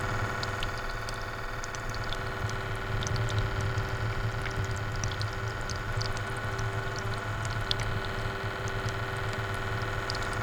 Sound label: Kitty water dish loop